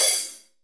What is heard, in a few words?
HH MED, DRY KIT, Real Mega Drums